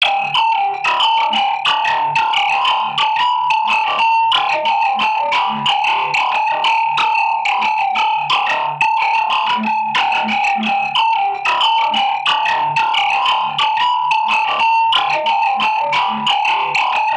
guitar scratch; M8; random and out of place

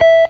BLU SFT FNGR, Guitar; 90 s Sample CDs; Quantum Leap - Guitar & Bass

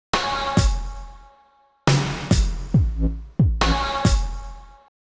Beat Kick & Snare, 138bpm; Zero-G Sound Sense Grime Scene; GS Construction Kits; Da Suspects; 90 s Sample CDs

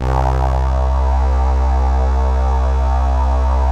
CRUMAR; Masterbits; Vintage Voltage

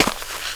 SNEAK DIRT, footsteps; AKAI S-Series CD-ROM Sound Library VOL-6

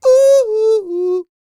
SING, Vocal Planet R&B & Dance; ILIO

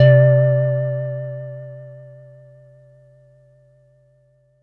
SYNTH Beauty Tone

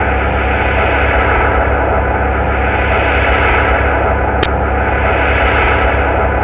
HUMM DRUM, ATMOS HUM; Spectrasonic Distorted Reality 1

